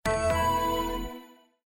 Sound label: Characters Logon Sound; windows 7